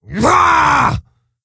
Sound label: Stonebark Attack; Elder Stonebark